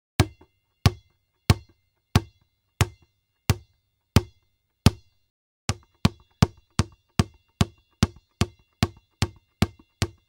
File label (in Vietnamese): re qua bong ro tren tay